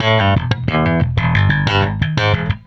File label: Electric Bass; Basses